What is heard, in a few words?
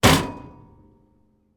impact metal fire vibrate